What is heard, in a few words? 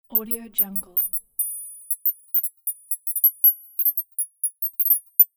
hitech device